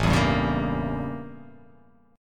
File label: A 7th Flat 9th; A7b9 strummed; JGuitar